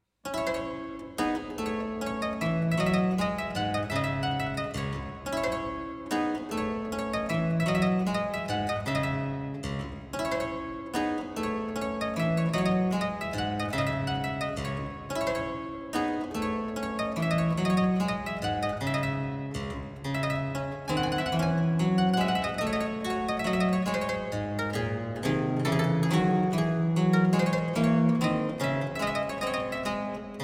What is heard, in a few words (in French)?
menuet; marchand louis